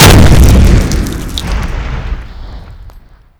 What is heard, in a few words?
Claymore explosion